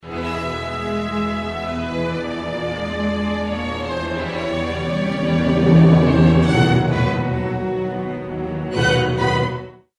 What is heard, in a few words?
rimsky korsakov scheherazade sea and sinbads ship; Scheherazade: The Sea And Sinbad's Ship; 1888